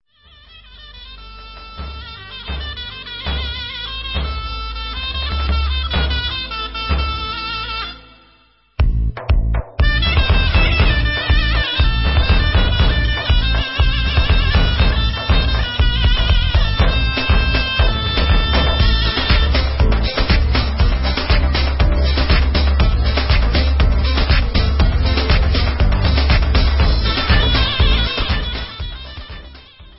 Kurdit Instrumental; Chagigah; Boni Piha

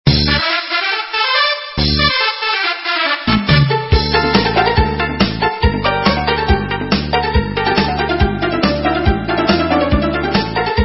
Hora Instrumental

